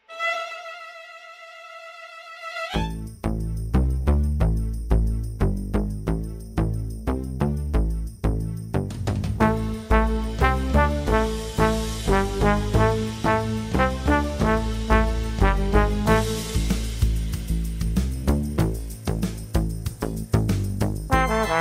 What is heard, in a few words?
trombone